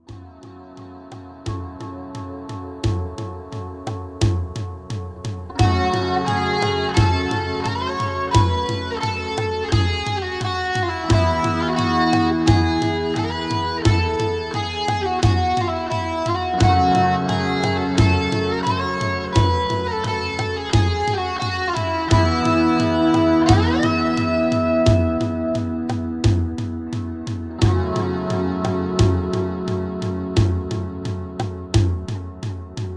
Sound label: Indian rytm